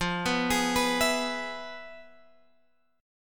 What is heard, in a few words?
F Minor Major 7th Flat 5th, F, Guitar, Open G; 15,16,18,16,17,x